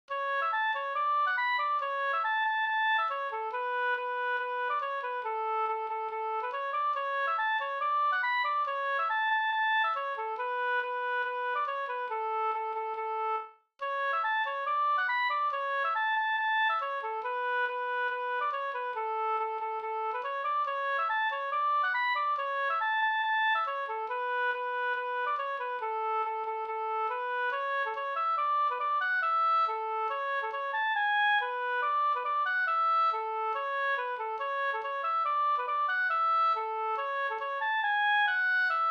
schottis 4-4 A dur

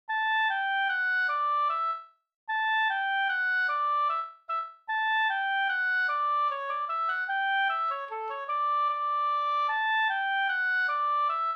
mazurka; Vavmazurka fr Bygdsiljum av Thomas Andersson

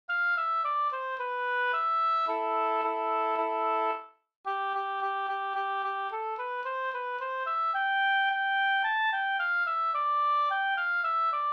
folkdans, katrilli fi; Karjala

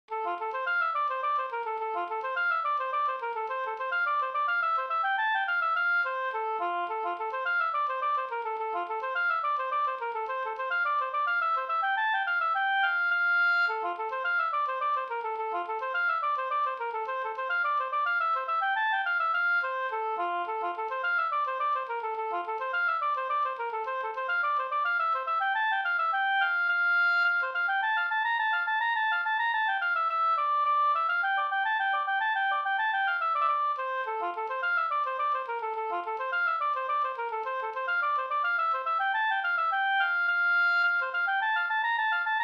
slängpolska

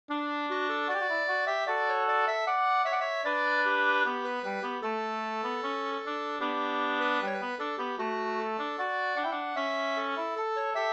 skanklat

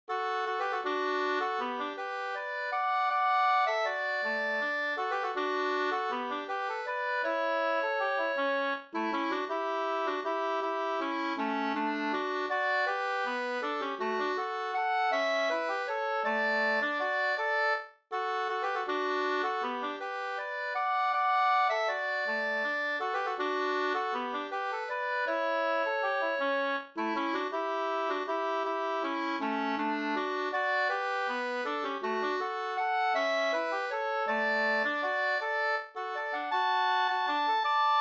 vals D dur

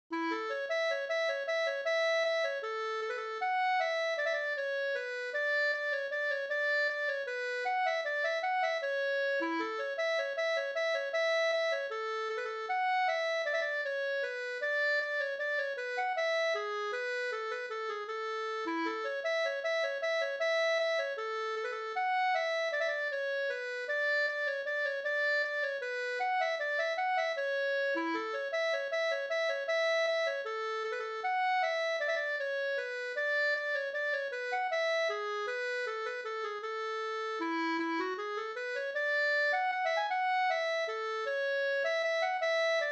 vals Brudvals

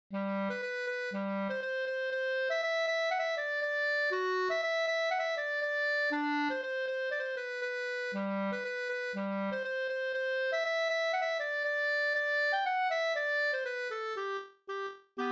schottis; e Ryman Stugun Jm

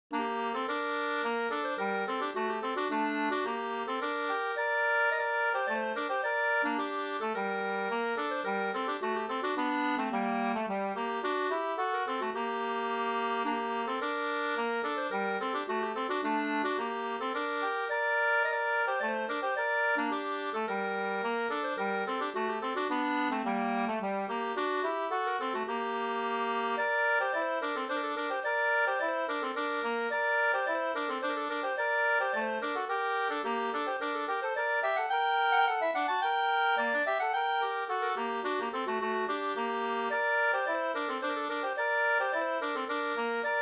polska, 2st; Arvid Brannlund SvL JH651

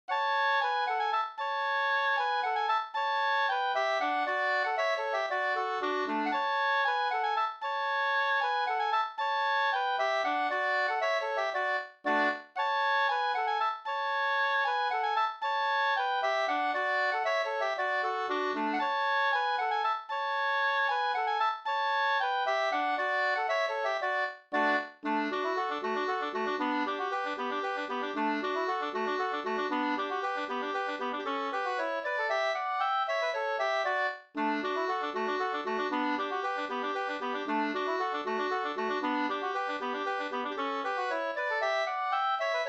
polska, 2st

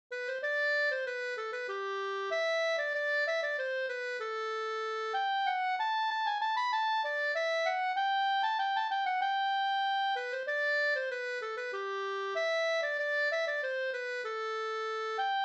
skanklat; Tabergs brannvinslat Rattvik

